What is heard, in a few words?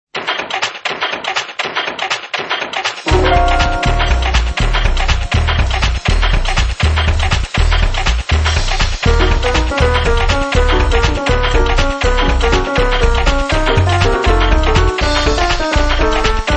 piano